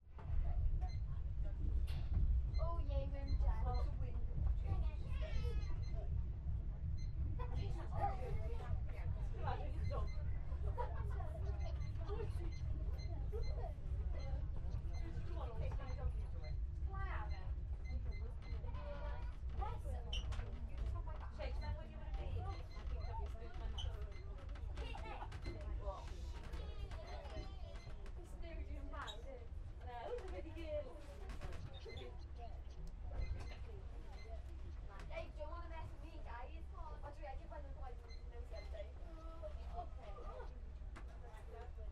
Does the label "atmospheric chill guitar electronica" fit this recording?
No